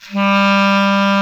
KLARINET A 1, CLARINET

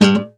KONZERTGIZ, Alpine Volksmusik

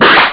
gegner shot; ST-XX Amiga Soundtracker Sample Packs

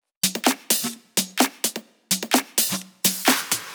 Drum Loop 128BPM FULL ohne BD

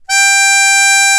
MUNDHARMO; Alpine Volksmusik

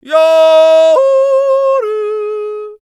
JODLER; Best Service; Ethnic Journey Sound Cube

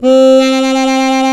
Mundharm vib, Alpine Volksmusik; Best Service

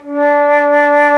FLOTE, Alpine Volksmusik; Best Service

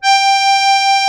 AKK VIOLIN, akkordeon; Best Service; Alpine Volksmusik

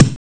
bass trommel; Amiga Soundtracker Sample Packs

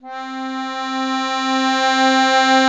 AKK VIOLISW, akkordeon; Best Service; Alpine Volksmusik

